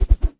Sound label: Air Swish Triple